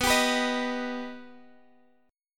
B Augmented B Guitar DADGAD

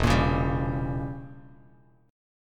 E Diminished 7th E, Bass Standard; Edim7 strummed; JGuitar

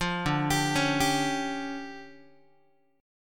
D Minor Major 11th, down, Guitar; DmM11; arpeggiated

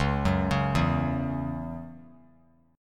C sharp Minor Double Flat 5th C sharp, chord; C#mbb5; arpeggiated; JGuitar

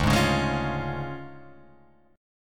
D Minor Major 7th Flat 5th, D

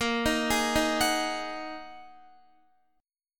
A sharp 7th Suspended 4th Sharp 5th, Guitar chord; arpeggiated; x,13,15,13,15,14; JGuitar

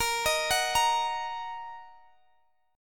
A sharp Suspended 4th Sharp 5th Guitar Drop D

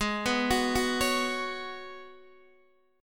Ab Minor 11th, Guitar, Open D; x,11,9,12,9,11; down

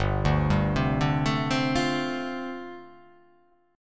G 9th Suspended 4th

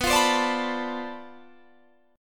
B Minor 7th Flat 9th Flat 5th B, guitar chord

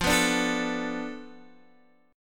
F 6th Flat 5th F Guitar Open G

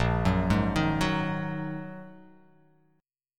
A sharp 7th Suspended 4th Sharp 5th, chord

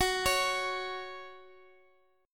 Gb 5th, Guitar; arpeggiated; 4A,4E,5A,5D,5G#,6C#

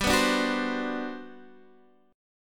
G Major 11th, chord; strummed; JGuitar

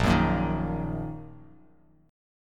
Bb Suspended 4th Sharp 5th Bb down, chord; strummed

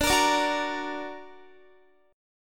D Augmented D down Guitar; D+ strummed; tuning 4E,4A,5D,5G,5C,6F

